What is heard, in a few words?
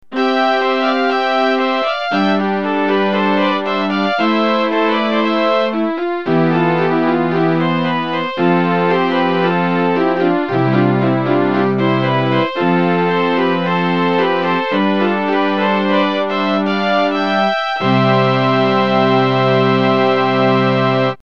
strings; tahorn midi keyboard